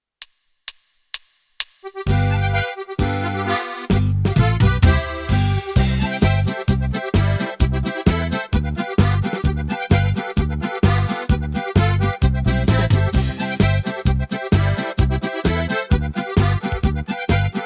mit Melodie

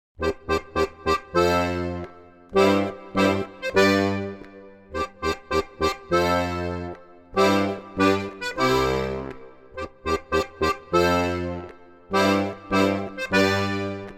Harmonika Suite

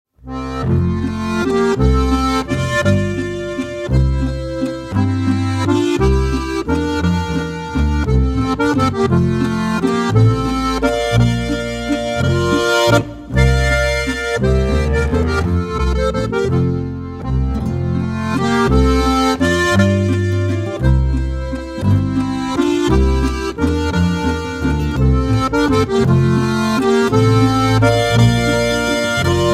Walzer

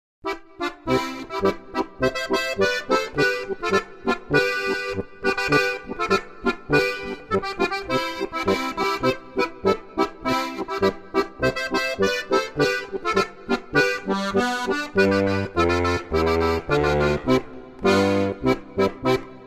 Polka Plattler

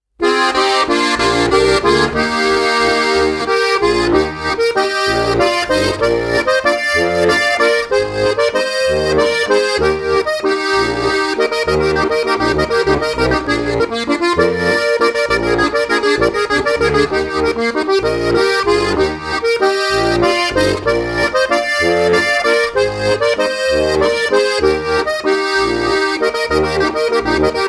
Walzer

